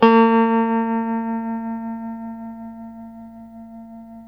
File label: TELE TREM B 3B, Guitars; Spectrasonics; Hans Zimmer Guitars VOL-1; TREMO-LUXURY